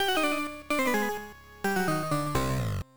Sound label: VS Defeat; bomberman 93 turbografx 16 pc engine